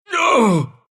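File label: pain, Russian; Dota 2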